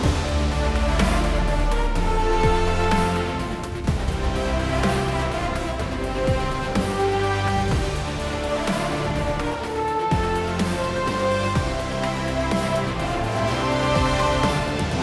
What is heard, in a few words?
music splash; lol; lcs ss2019